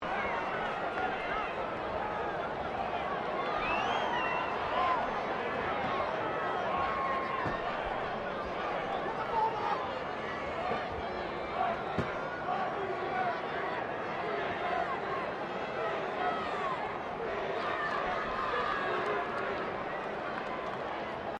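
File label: crowd 15K Rugby World Cup ambience low loop, Rugby world cup game; Crowd Sound Effects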